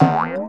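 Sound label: pot bounce; lomax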